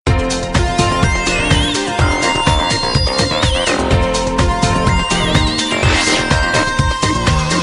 Msg Received

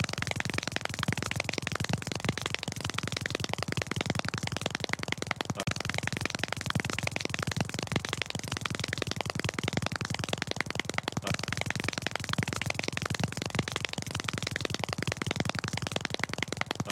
fastest clapping

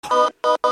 Let Me Out Beeps

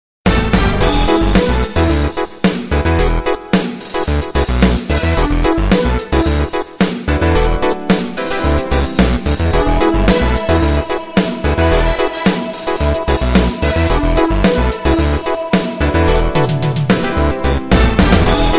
Sony Ericsson K700i

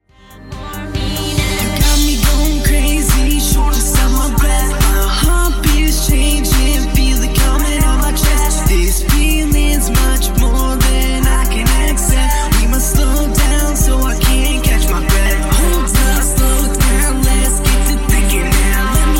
Shortness Of Breath

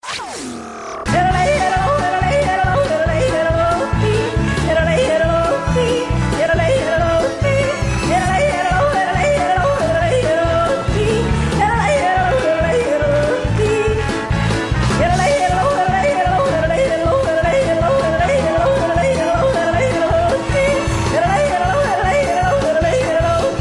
Crazy Yodeling

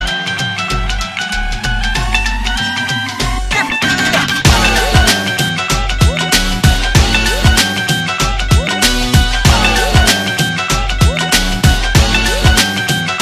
Punjabi Bhangra

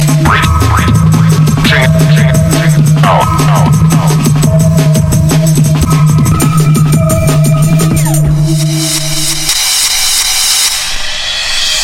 Trance; meloboom

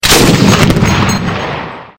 Canon

